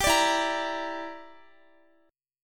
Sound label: E Minor 7th Flat 5th, Guitar; strummed; x,x,17,19,16,17; 4E,4A,5D,5G,5C,6F; Em7b5